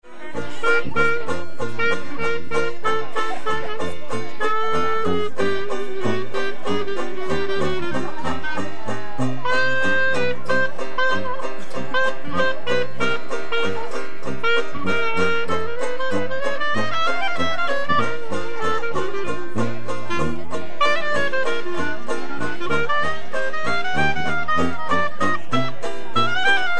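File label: clarinet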